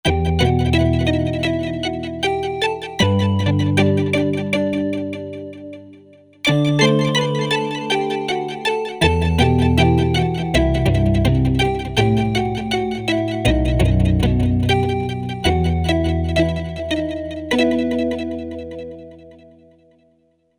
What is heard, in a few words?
2 voices; Korg MSC-12S Synth 3